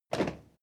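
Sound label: step crowd march normal single right, footsteps; schritte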